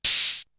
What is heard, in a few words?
Open hat; ZERO G - Datafile DISC 3